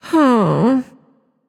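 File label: sigh, female voice; smite; voicepack; hera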